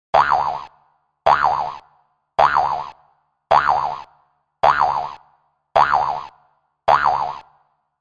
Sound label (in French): RESSORT